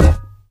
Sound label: physics cube